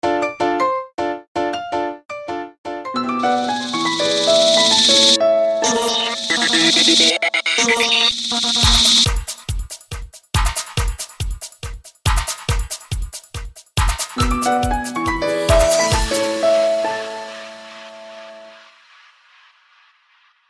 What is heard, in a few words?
Nokia Ring New